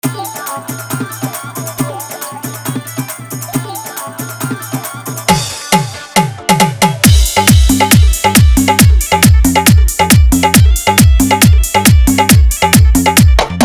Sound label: Full Dhollki Bass Dance Mix